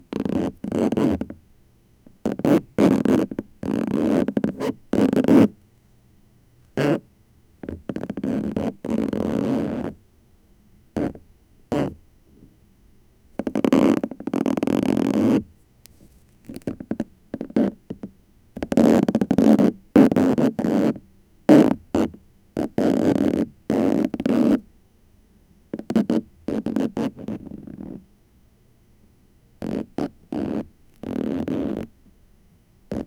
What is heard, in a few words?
persistent soft scratchin, woodwork